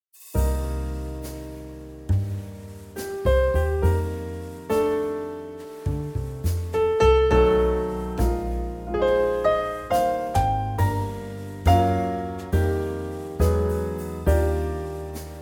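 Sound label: ballad Eb trio, backing track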